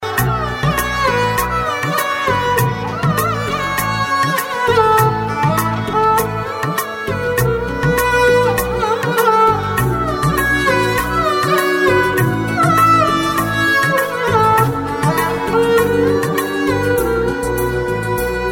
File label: hot indian ringtone